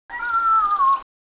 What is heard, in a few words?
ambi, jungle; gearbox